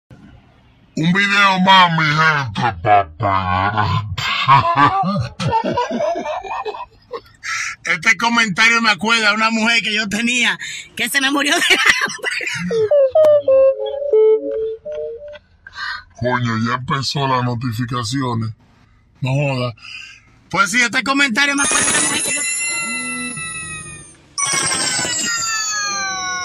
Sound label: ahh